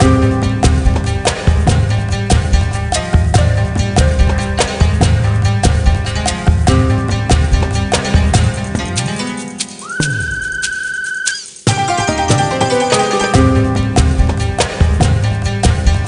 Geese Herding Fast; Disney Princess: Enchanted Journey; Wii

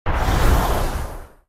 Tornado End

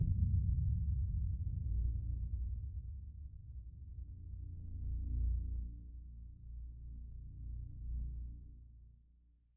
harvest fail

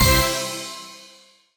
Win Rolling End; bikini party online gamerip 2021

